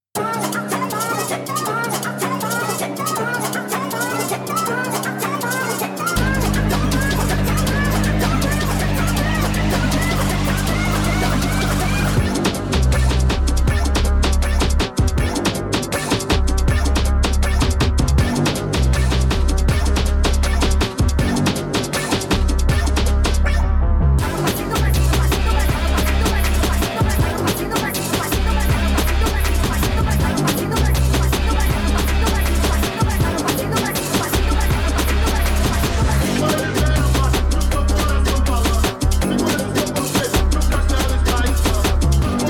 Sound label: brazilian phonk sped up